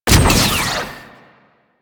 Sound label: Heat Gun Shot; Grineer